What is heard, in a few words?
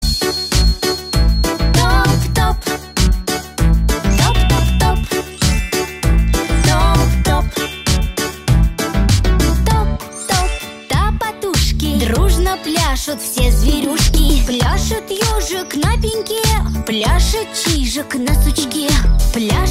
instrumental